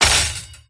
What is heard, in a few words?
screen break